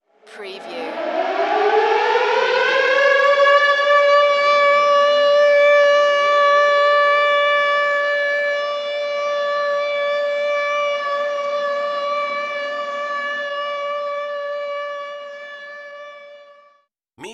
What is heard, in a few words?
Air Raid All Clear